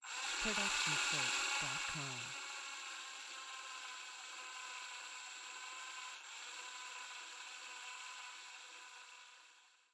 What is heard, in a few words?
drill regular long; SoundsCrate